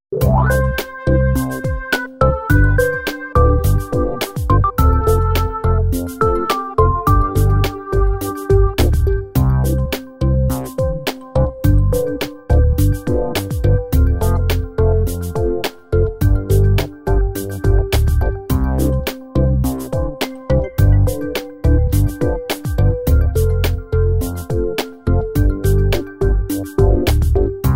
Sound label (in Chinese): 匹配背景音乐